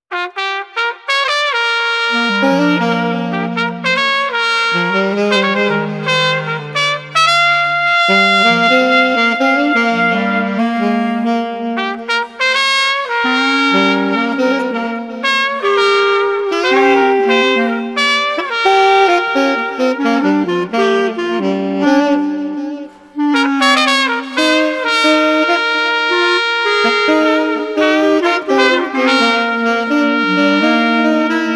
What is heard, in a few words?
Bluesy Trumpet Sax Clarinet 85